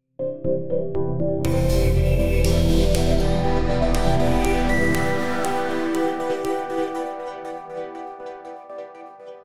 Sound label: progressive deep house